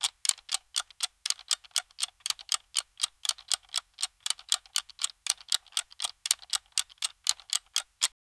date counter digital

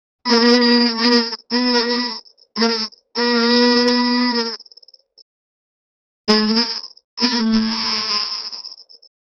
cartoon beetle buzzing

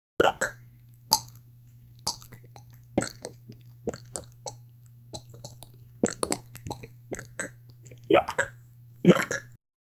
soft playful munching sou; cute

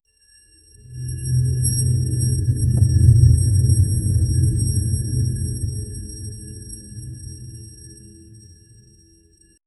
soft magical chimes

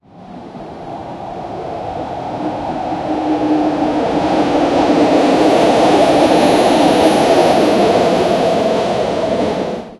monorail; monoriel

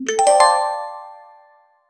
polished sound, achievement

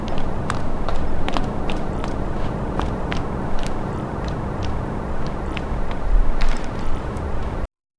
gentle wind soft city hum

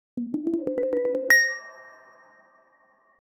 psychedelic mushrooms cos

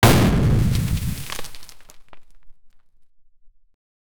fast explosive destructio, building

